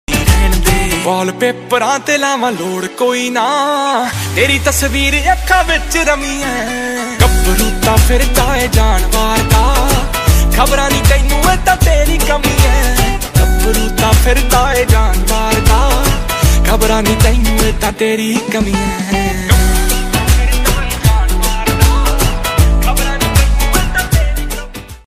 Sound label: new Punjabi song ringtone romantic love sad